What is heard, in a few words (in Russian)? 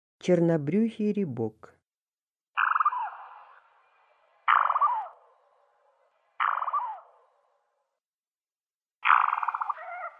chernobryuhiy ryabok golos